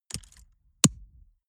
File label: mechanics bits; industrie-wirtschaft; mechanik